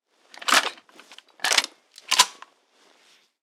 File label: Wpn shotguncombat reload, shotgun combat reload